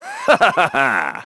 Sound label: LAUGH; BattleSNDS